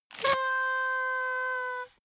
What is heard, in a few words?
paper hoot soft